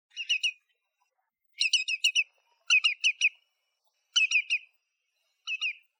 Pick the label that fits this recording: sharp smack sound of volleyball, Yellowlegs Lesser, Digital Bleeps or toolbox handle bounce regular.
Yellowlegs Lesser